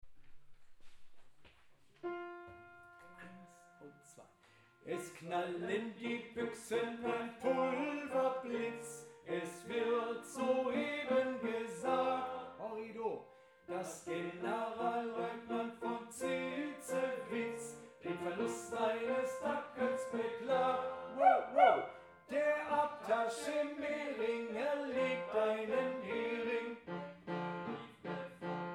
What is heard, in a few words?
tenor